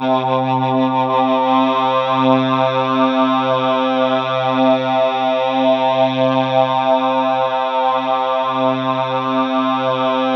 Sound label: C3, Synth Leads